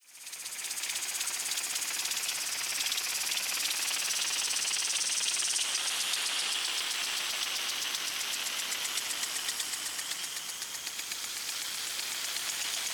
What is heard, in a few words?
Molokai Sprinkler